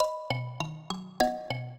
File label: minuet, mbira; Mozart